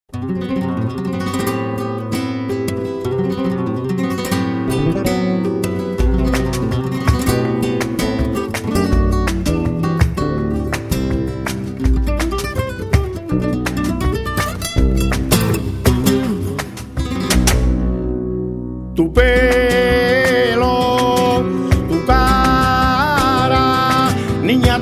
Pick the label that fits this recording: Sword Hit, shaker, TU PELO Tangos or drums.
TU PELO Tangos